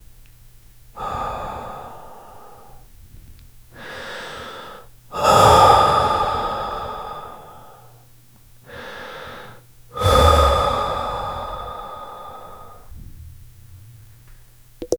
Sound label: slowly breathe out throug, meditative